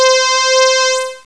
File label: RAYBEAM